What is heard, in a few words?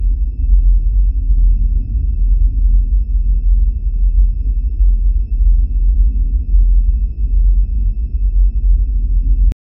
looping dread, vehicle